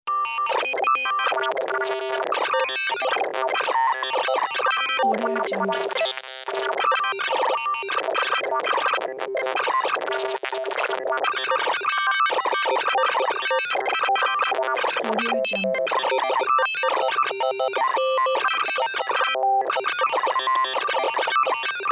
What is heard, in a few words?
data transmission